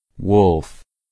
wolf

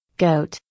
goat

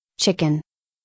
chicken

